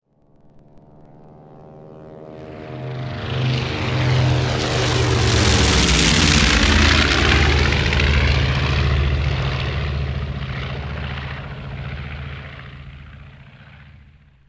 fly by; WAR

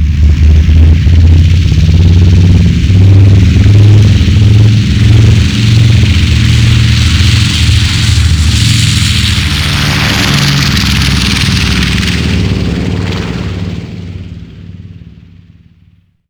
Henschel taxi; WAR

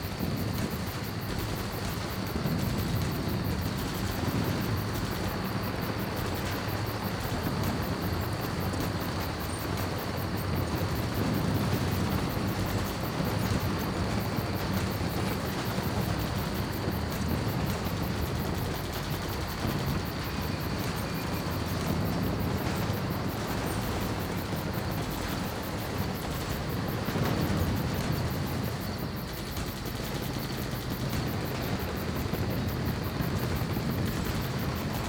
in battle; WAR

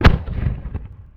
distant cannon; WAR

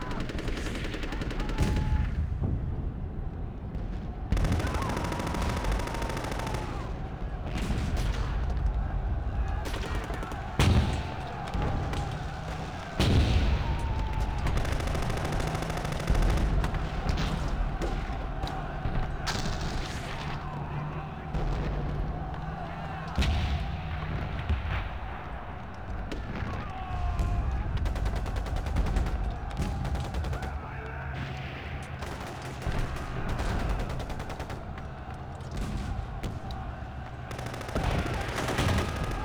large battle; WAR

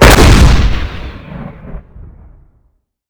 105mm shot

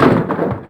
metal; WAR

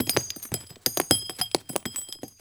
mg42 multi shell; WAR

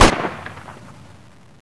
30cal; WAR

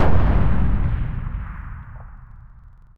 allied sniper distance; WAR

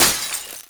glass shatter; WAR

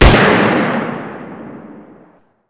kar98; WAR

